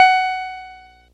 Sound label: F sharp 4; piano